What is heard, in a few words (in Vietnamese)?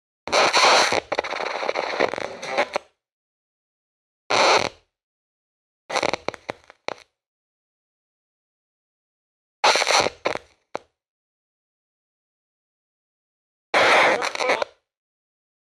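Tiếng băng đĩa hỏng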